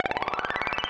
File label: win Count Up; netent